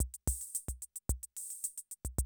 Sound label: CR78, DrumLoops